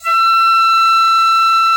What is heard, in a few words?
PICCOLO E6, WOODWINDS; Greytsounds VOL-1